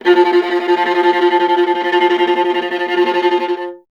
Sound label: viola F; Miroslav Vitous; Solo Instruments VOL-1